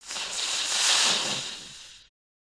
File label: baby spider idle; creatures mechanical